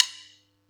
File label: Yamaha EX-5